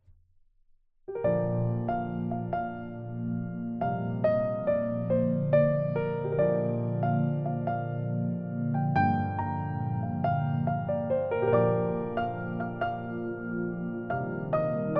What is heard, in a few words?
Vocal Removed Karaoke